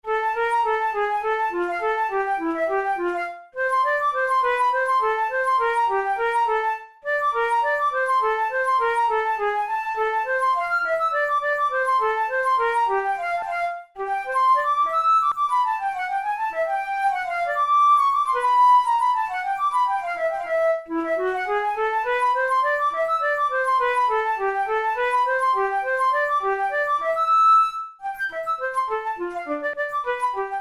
studies for the boehm flute; drouet 72

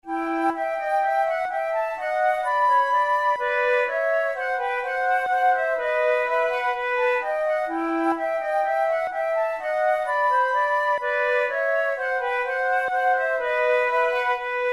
sonatas for two flutes andante

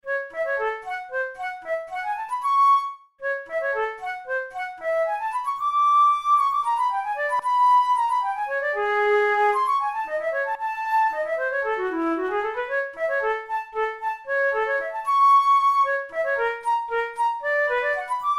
study in A major; kohler op93 no2 study no8

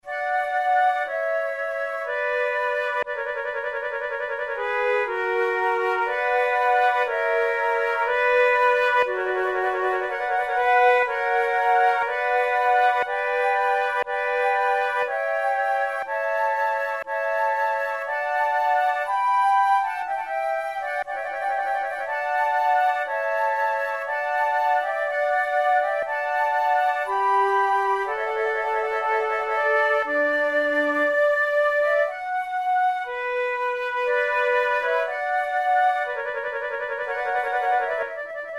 sonate en trio, lentement, flute

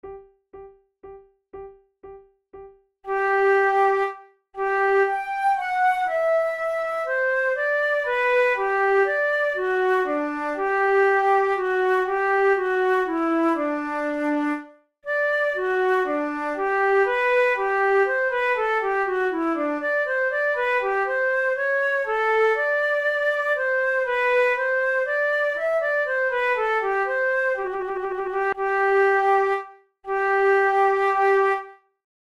prelude, flute

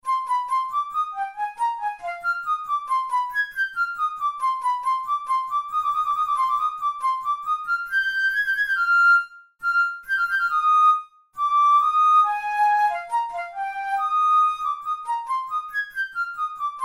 in c minor, flute

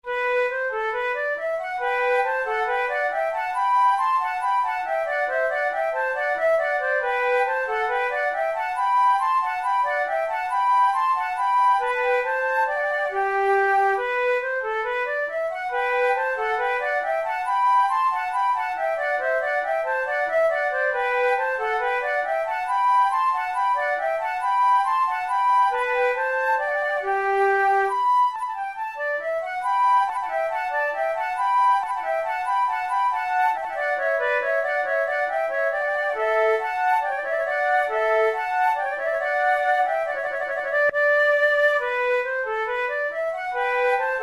musette, flute; naudot; 6 babioles op10 no6

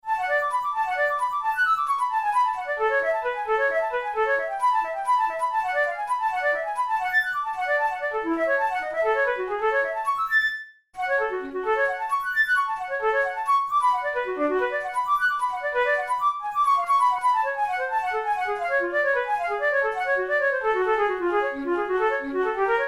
caprice etudes, flute

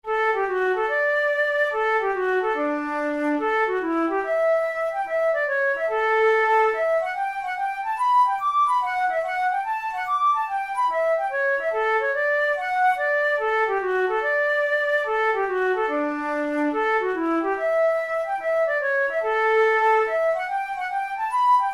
hornpipe, flute

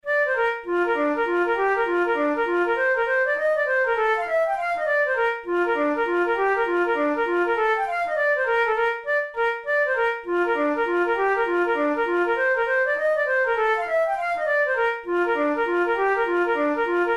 hornpipe, flute tunes

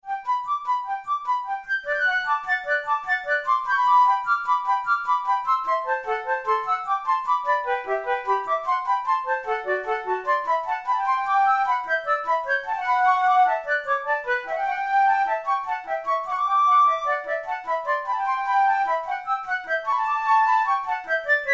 in g major, flute

